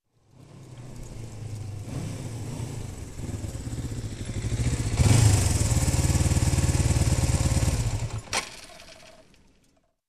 Motorcycle approaching and stopping

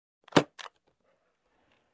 Suitcase Close

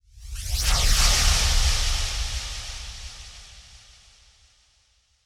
Super speed dashing in hall

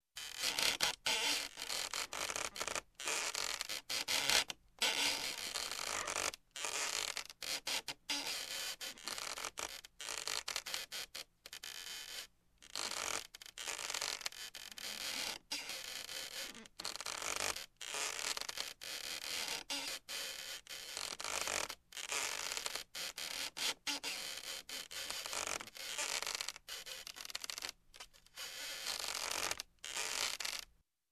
Hanging Rope Squeak

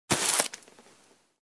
Box of clothes being dragged

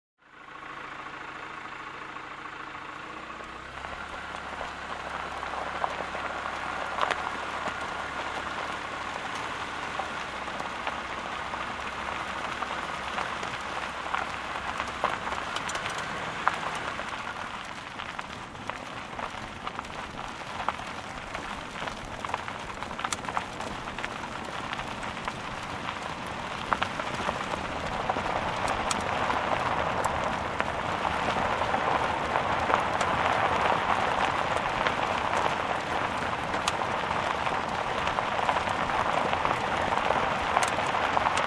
Driving on dirt road with open window

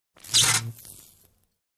Electric Shock

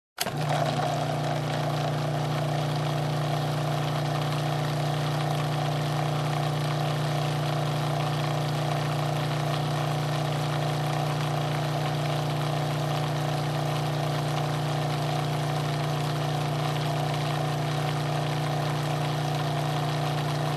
Electric Grinder

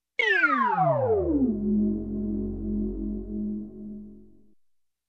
Electronic Sci-Fi Drop

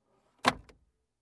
Closing Glovebox

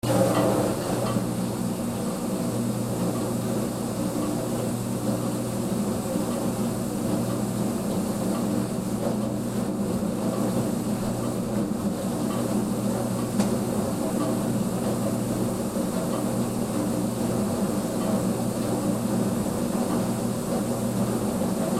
Industrial Washing Machine

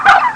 Short turkey cluck

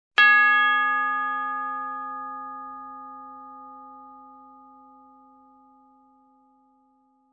Tubular Bell hit G

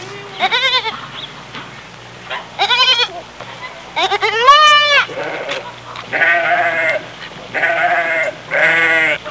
Lamb and bleating sheep in the background

